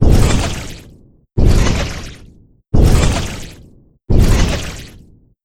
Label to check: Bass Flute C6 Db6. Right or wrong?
Wrong